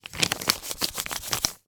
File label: office printer label stick to surface rub air bubbles out